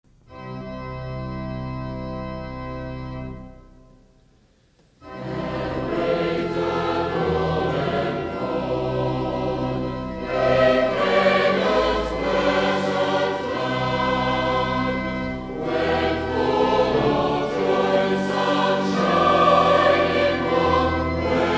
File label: Choir, hymns